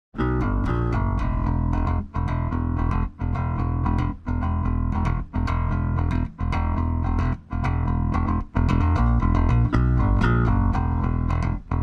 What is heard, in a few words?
rhythm